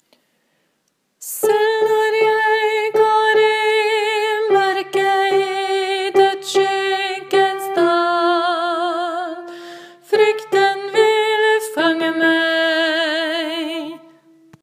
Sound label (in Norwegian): bass; salme-23 selv-nar; åfjord